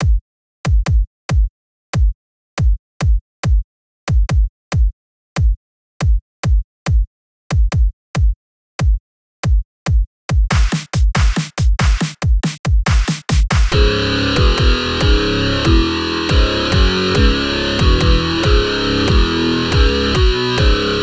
COMBAT HEAVY (slow)

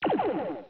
cannon; boss3

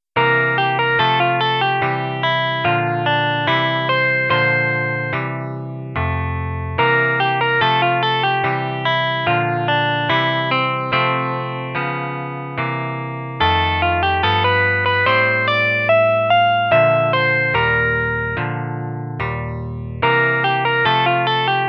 entrance blues

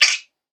squish

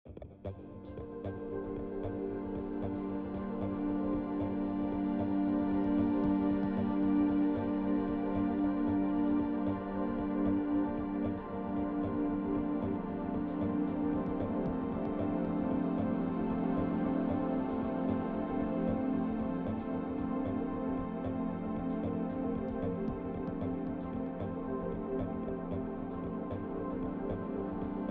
shadow

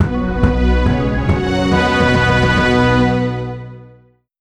jingle

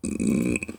burp

